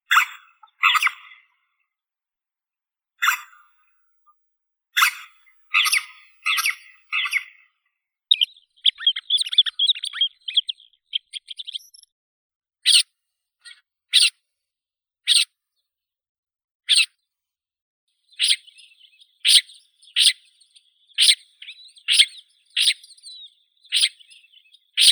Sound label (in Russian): zvuki prirodi sorokoput chernolobii lanius minor